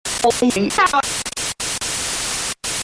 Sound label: Static Accents, Stingers; iLife Sound Effects